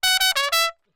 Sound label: 128 Latin Jazz Trumpet F#